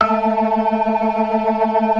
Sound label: ORGAN, 4 DRAWBARS; Keith Emerson Signature Series